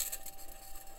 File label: mic, mars2020 edlcam